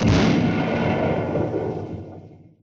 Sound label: cartoon boom; Crash Bang Boom